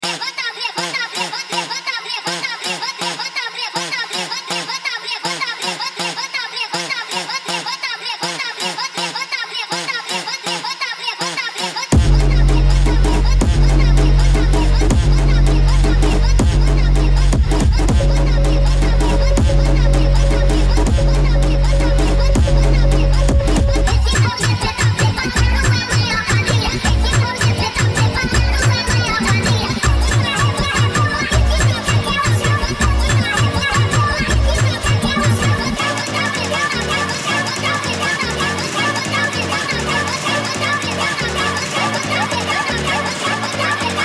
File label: brazilian phonk sped up